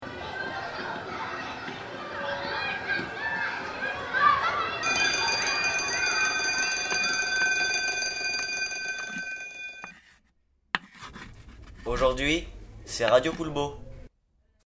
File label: radio jingle; radio poulbot